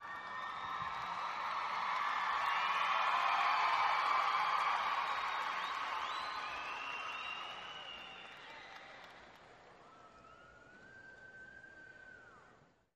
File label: Crowd ( 30, 000 ): with Whistles / Applause, Medium Surge / Dies. Cheers Crowd, Huge.